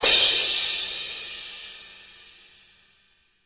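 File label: cymbal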